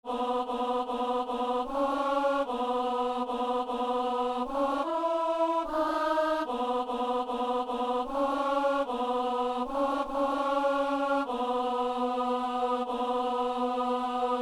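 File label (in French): ténor; Saint-Denis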